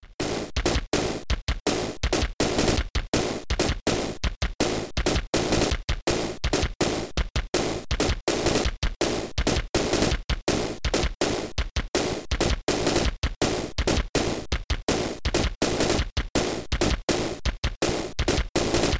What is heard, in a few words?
Drums